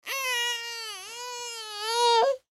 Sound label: Human Moan; Moan Whine Long Male Toddler; Pain; Blastwave